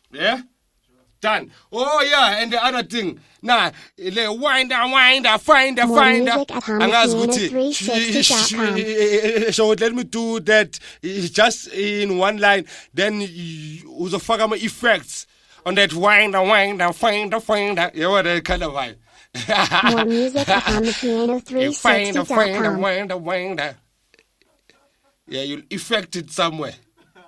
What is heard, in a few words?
kwaito